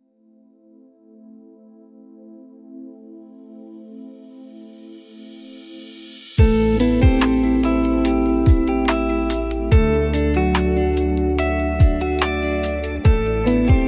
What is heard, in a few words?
gentle uplifting underscore